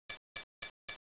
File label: pipip